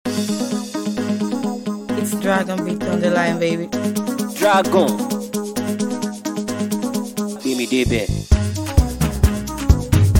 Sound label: GSM Beat